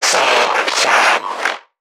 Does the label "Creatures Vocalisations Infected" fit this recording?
Yes